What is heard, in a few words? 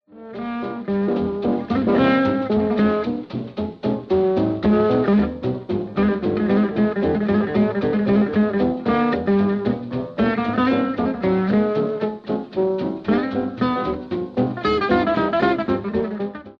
farty 440